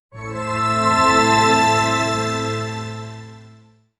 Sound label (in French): un logo sonore moderne